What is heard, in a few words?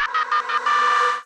"ah" false alarm, Voice; ooh ahh